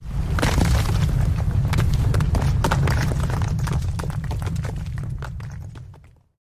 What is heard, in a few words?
Rocks falling from mountain